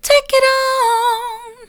take it on soft, vocal

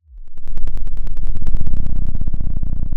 pop, Sound of an engine revving

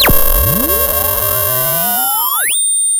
sound of a bike bell

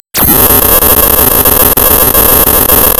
pop, tearing paper

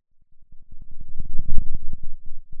Sound of a thunderstorm, center

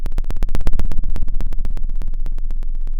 pop, Sound of a fire crackling

